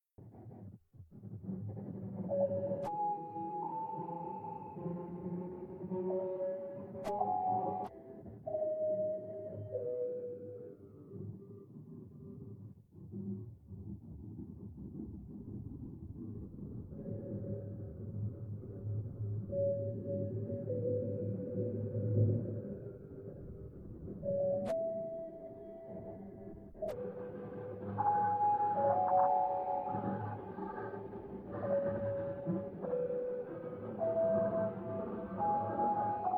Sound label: Harmony 6 (Glockenspiel)